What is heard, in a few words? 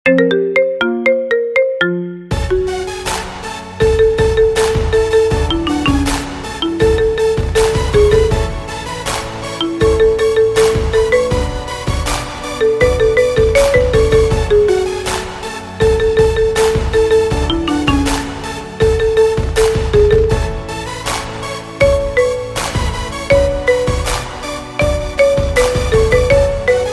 Marimba